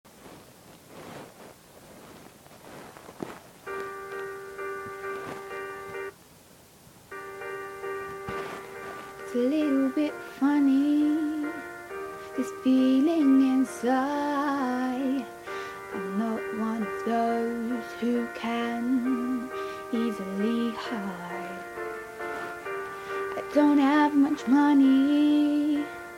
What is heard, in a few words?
me singing